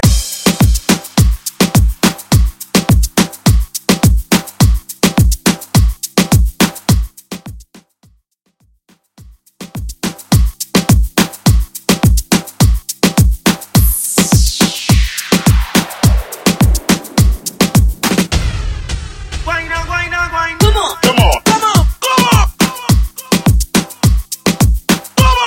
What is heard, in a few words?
Top40 Reggeatton